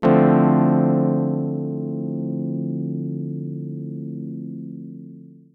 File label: SYNTH Dark & Loud; Interbellum